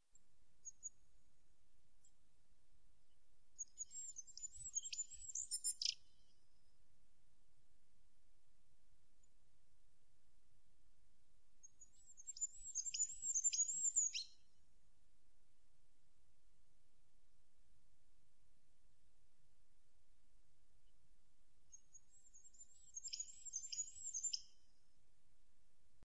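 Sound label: goldcrest voice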